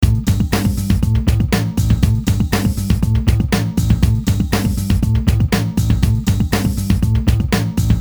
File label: depressing rock music